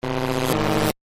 Static Voltage, Production, Element, Static, Voltage, Buzz; BlastWave